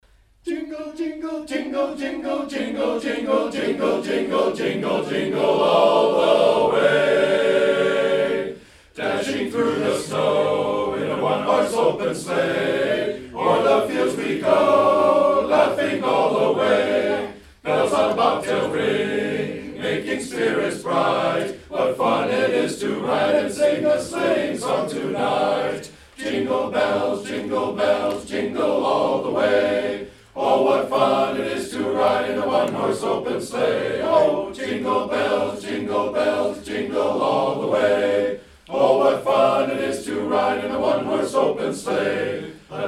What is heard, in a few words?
Jingle Bells